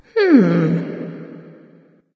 thinking light; smite; voicepack; hel

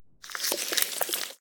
wave tentacle; smite; cthulhu

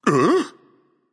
surprised; smite; odin

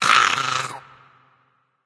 ouch; smite; voicepack; kali

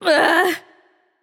surprised; Smite; bellona

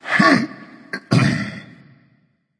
grunt jump; cernunnos

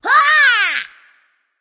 nv battlecry; smite; voicepack; ratatoskr

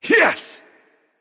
"yay"; smite; merlin

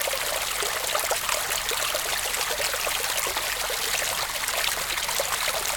ambience water small fountain; smite; conquest

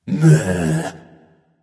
tounge; smite; voicepack; janus

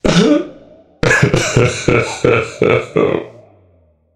boohoo; smite; heimdallr

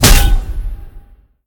hit; Smite; Tiamat

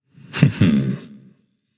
heh heh; smite; vamana

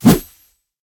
swing; Smite; god Kuzenbo v2

